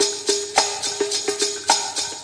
107 bpm only percussion; 101 to 110 bpm

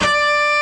orchestra string hit D

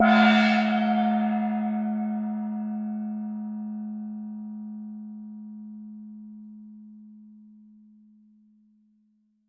gong; Gongs and Super Crashes

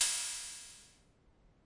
Rattlesnake cymbal, BME 1983 drum machine; DRUM MACHINES

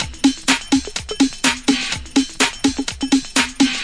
125 bpm plucky garage beat

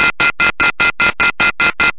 off da hook, Phone Tones

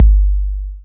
kick, 808; DRUM MACHINES; 808 Basic

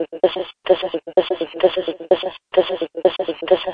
vocal loop; Ze Franks Pain Pack

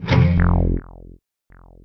bow bass echo; Basses

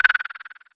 crikix, African and Eastern Percussion

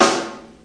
ambient snare; single hits

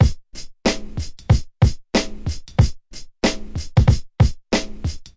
muffled boom bap, drum loops and breaks, 93 bpm; 091 to 100 bpm

